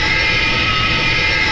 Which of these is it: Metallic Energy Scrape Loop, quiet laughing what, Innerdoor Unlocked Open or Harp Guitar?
Metallic Energy Scrape Loop